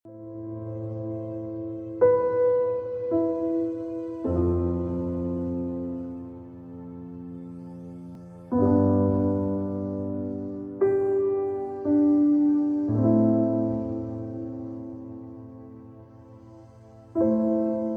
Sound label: Piano Relaxation